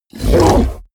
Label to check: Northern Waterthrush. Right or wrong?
Wrong